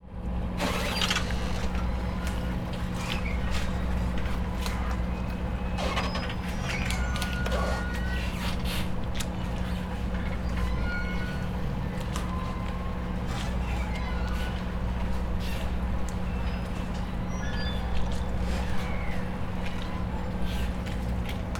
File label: ferry